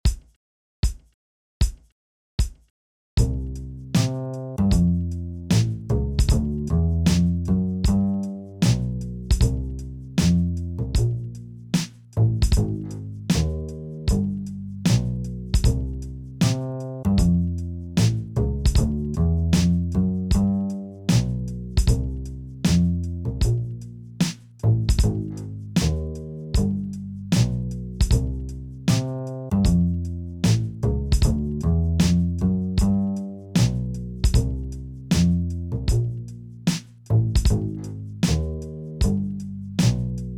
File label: Backing Track for Comping, Jazz, Chord Changes; Jazz Excerpts; Big Dipper; Jazz Audition